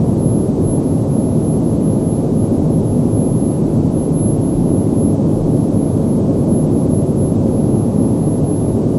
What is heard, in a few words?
indoor wind; ambience